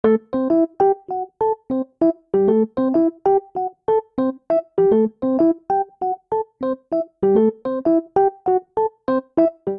Ostinato Latin Cuban A Minor 100 bpm, Electric Keyboards; MIDI Clips; Ableton; Factory Packs